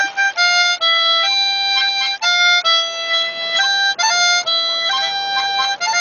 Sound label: Harmonica